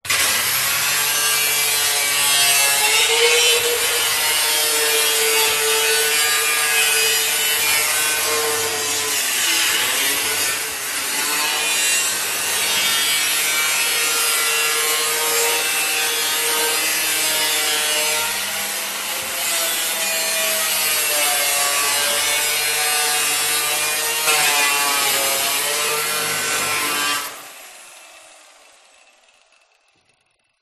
industrial angle grinder long

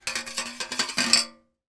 household grater metal knife scrape on grid; zapsplat

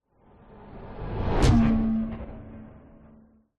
sound design whoosh sci fi pass hit; zapsplat

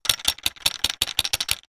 household torch flashlight maglite shake; zapsplat

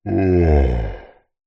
fantasy giant male sigh

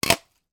household deodorant cans x2 hit; sound effects one

